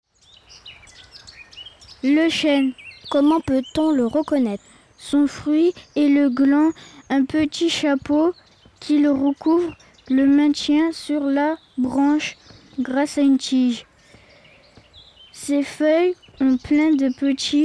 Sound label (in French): Le Chene avec amb